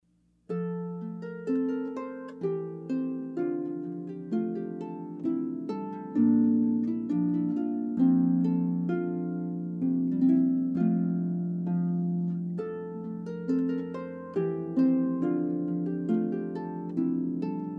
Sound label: Hornpipe Purcell